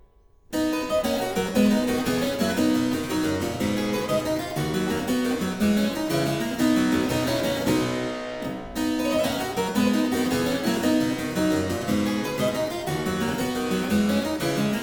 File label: Aria Variations in C Major, Harpsichord; More Palantino; Variatio 11